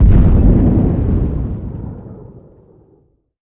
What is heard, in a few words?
molotov detonate distant; weapons; Counter Strike Model Pack; CS2